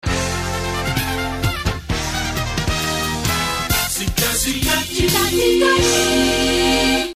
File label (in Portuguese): Vinheta; Cidade FM